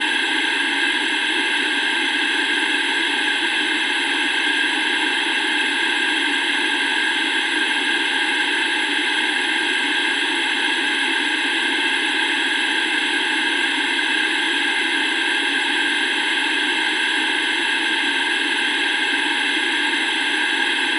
Like Static From Old Cheap Portable Analogue Television